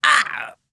VO Death; VIP

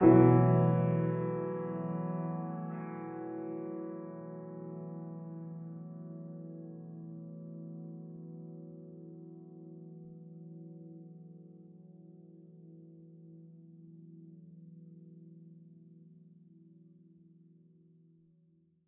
Piano

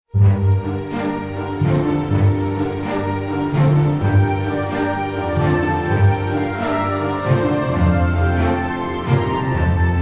Tavern; heroes of might magic v gamerip 2006; Sylvan

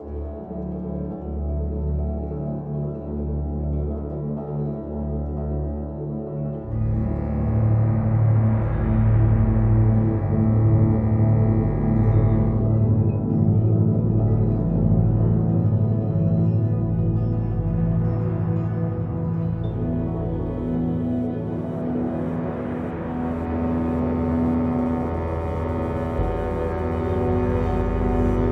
loop Demons

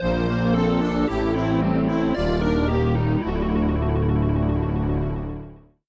Item Acquisition Fanfare (Mono); Metroid Zero Mission Remastered

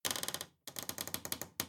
wood creak

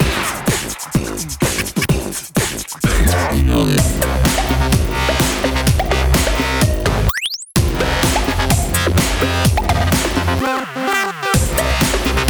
Boom Bounce; Streamline

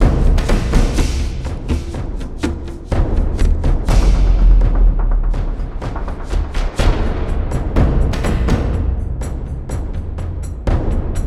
Caves Drums Loop; Ninjago Monastery; lego universe macos windows gamerip 2010

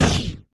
Explosive Bolt Shoot

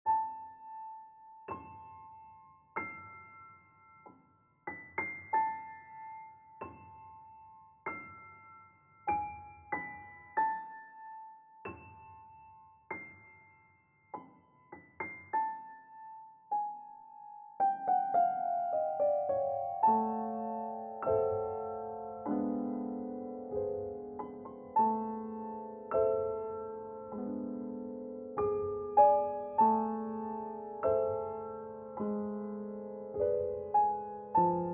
Piano Version; Fleischlos

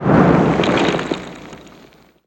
Debris; 1916 der unbekannte krieg gamerip

